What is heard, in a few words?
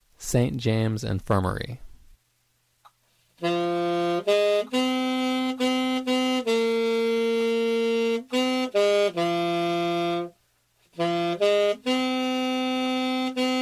alto slow saxophone